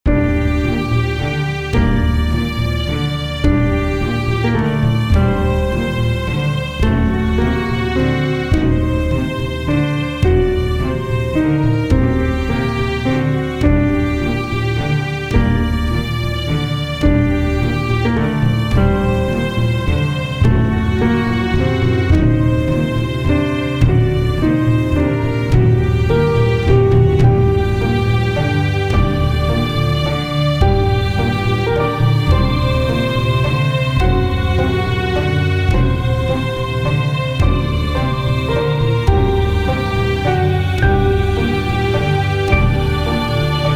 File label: cathedral Loop